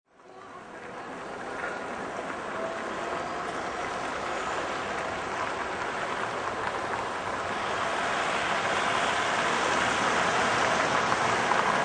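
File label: City Ambience Occasional Tram; City traffic: On traffic bridge. Perspective in the middle of traffic, surrounded by cars and trucks driving by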